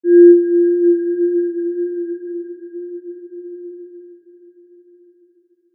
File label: Aurora G4 p, healing soundscapes